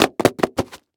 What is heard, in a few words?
Latex Gloves Box; Medical; Blastwave